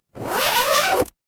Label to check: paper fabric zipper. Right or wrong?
Right